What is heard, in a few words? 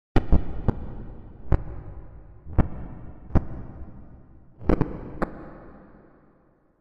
Static Distorted, static